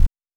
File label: hover; Sonar Beat; Android